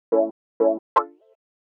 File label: house synth 125 A, keys